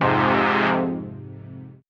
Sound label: Fm Dub Chord; CHORDS; Electronisounds; Enigma Dub Cube One Shots